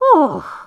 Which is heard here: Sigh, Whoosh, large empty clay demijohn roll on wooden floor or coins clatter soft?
Sigh